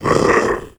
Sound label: troll die; wesnoth